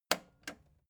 record player repeat jump out click slow

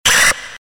short movement sound

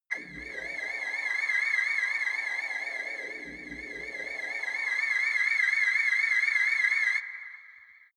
orbiting ufo

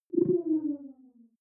wobble drop high

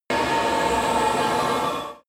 extrinsic air flow

